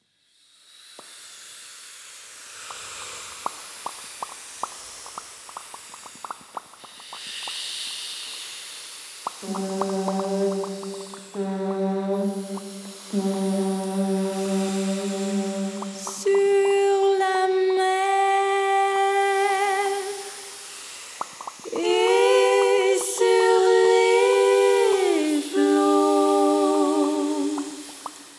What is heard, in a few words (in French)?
Jingle eau